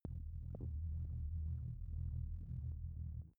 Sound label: space dark huge shuttle; raumschiff